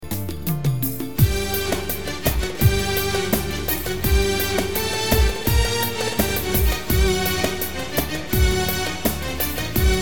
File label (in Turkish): yayli keman; korg pa500 tr